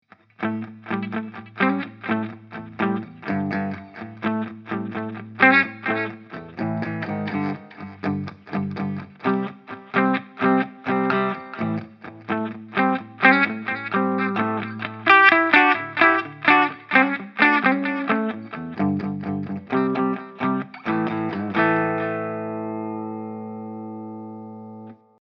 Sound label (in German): Ibanez AS93FM steg clean